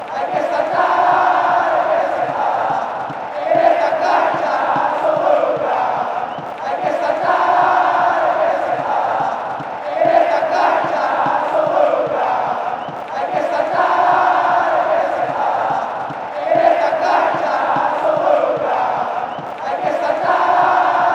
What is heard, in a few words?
hay que saltar fanchants